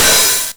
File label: 909 OHH Tape Sat; Goldbaby Tape808 & Tape909; The Tape 909